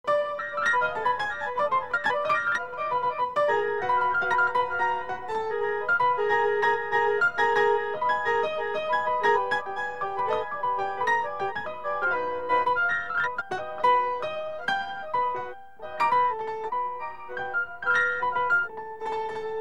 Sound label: classica